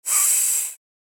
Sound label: Inflatable toy inflation single blow